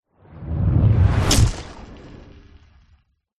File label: sound design whoosh flame fast dark impact thud vibrate